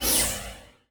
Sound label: controller close; immibis